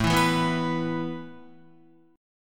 A Suspended 2nd A Guitar; Asus2 strummed

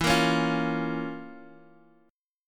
F Major 7th Suspended 4th Sharp 5th E

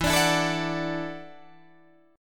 E 7th Suspended 2nd Suspended 4th, Guitar, DADGAD

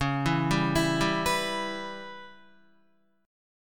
Db Minor 7th Flat 5th Db down Guitar; arpeggiated

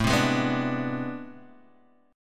A Minor Major 11th, down, Guitar, Half Step Down

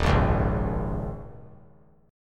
Eb 7th Suspended 4th; Eb7sus4; strummed; JGuitar

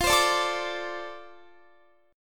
F 6th F down Guitar; F6 strummed

